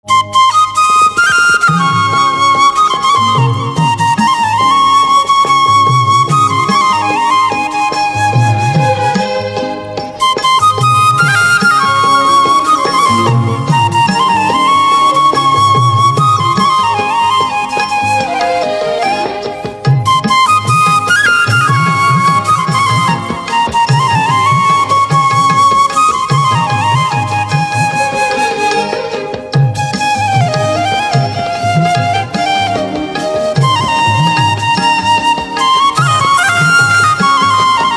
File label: Flute